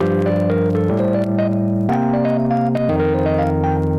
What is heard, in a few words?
keys, Phonky